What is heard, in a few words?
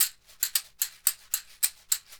CAXIXI